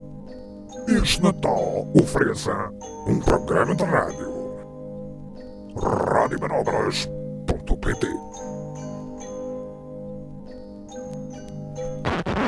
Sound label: jingle